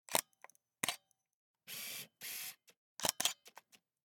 Camera, Pentax KS2, DSLR, electronic, mechanical, ready shot, flash up, focus, quick, snapshot; Eiravaein Works; Vaeyan IV